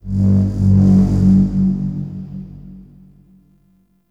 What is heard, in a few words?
Metal Rumbles; Warframe; The New War; Zariman